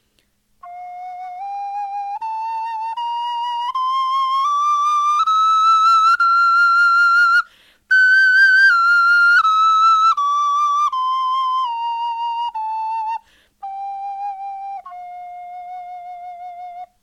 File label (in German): tonleiter, knochenfloeten